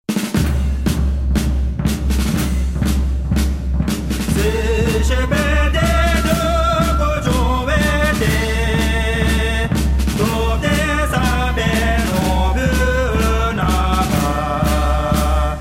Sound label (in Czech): tibetska hymna